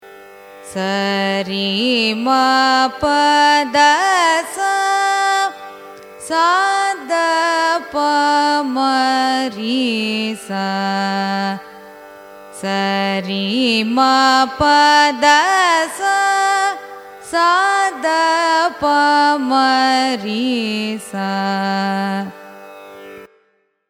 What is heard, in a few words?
raga suddha saveri arohanam avarohanam; carnatic music